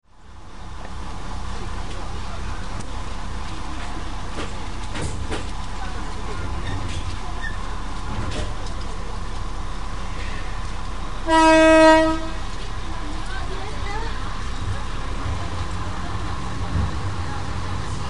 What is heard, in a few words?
kiha11; fukuen